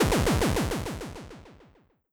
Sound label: death explosion player